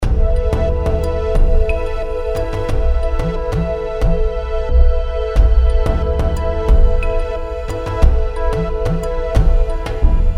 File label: Mystic Lounge C Short Loop